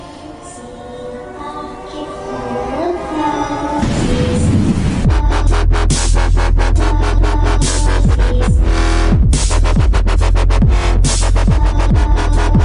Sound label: halloween dubstep